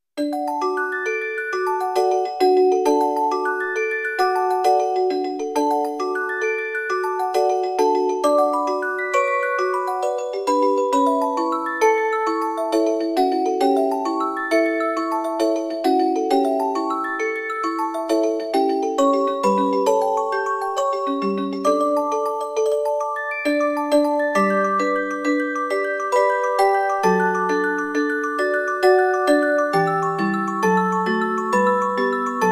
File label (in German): wiegenlied; ブラームスの子守歌; J.BRAHMS